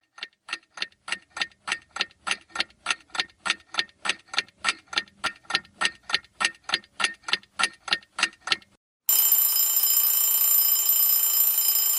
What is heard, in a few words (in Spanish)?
AUDIO DE RELOJ DESPERTADOR